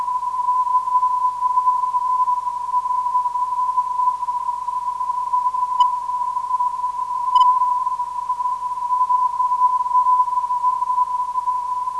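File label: 316kHz, non-directional beacon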